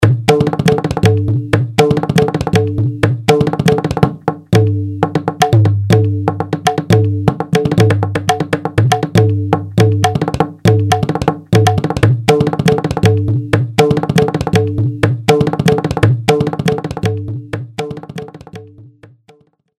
kappi mridangam

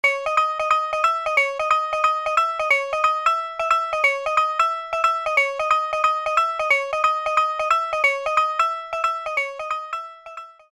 tumbi

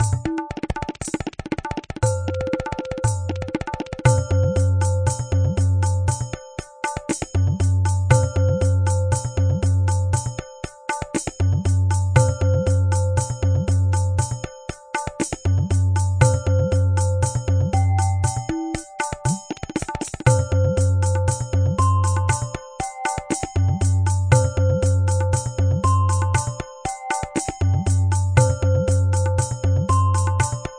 tintal, grooves

